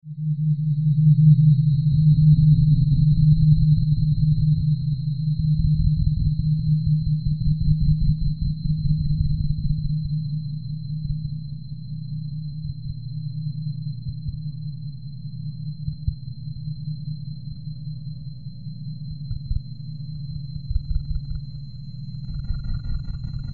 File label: Music Eerie Throbbi, pads